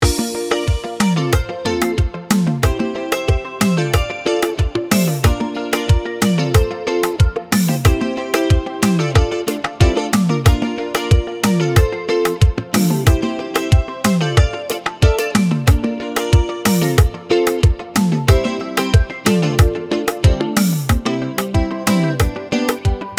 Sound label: Zouk; AKB Session